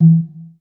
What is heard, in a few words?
roll; Bombardier